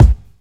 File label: sharp kick drum sound a key; kick drum samples